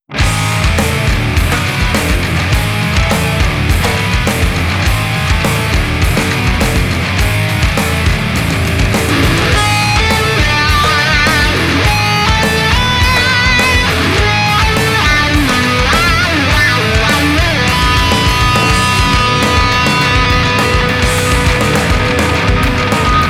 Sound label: Space Surfer